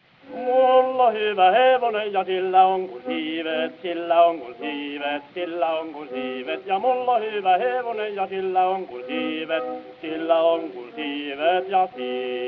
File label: Gramophone